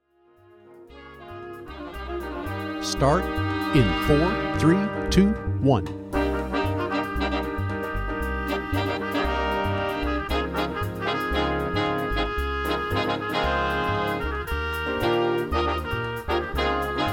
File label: guitar cut